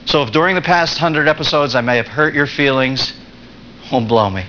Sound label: hurt